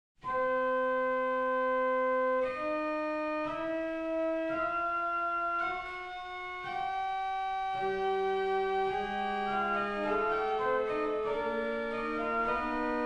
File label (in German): Kunstkopf, orgel; pipes; Pachelbel; Ricercar